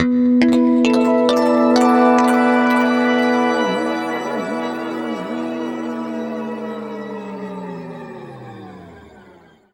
harmonic, guitar; East West; Steve Stevens Guitar Samples Collection

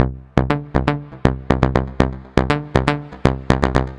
Delay Bass; Sonic Foundry (Sony Creative Software); The Electronic Music Manuscript Collection; Bass Foundations

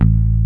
MT PICK

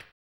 HAMBONE; Blues & Soul

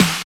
SNR SNARE, Snares; AMG; Now CD-ROM (Roland); NOW! Drums

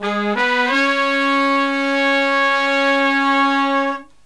Killer Brass Riffs, MED; USB Soundscan vol.29 - Killer Brass Riffs; AKAI

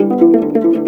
AF HRP GRV, HARP PHR; Spectrasonics; Heart of Africa 2

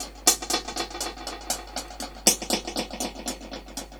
Dub Drums B Echo Hats 120bpm; musicradar

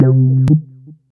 lead (brass) Lo note; Sonic Foundry (Sony Creative Software); Ugly Remnants VOL-2; Leads

